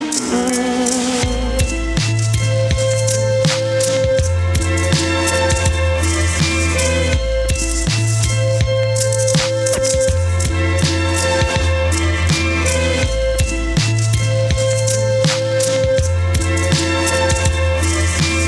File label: ambient; Ketama radio; Ketama Gold Podcast